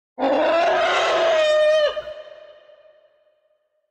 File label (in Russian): ornitohejrus, zvuki letayuschih dinozavrov